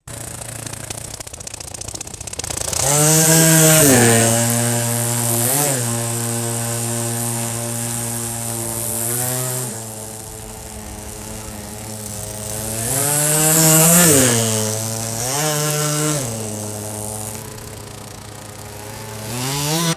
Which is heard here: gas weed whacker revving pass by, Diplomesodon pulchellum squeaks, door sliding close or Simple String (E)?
gas weed whacker revving pass by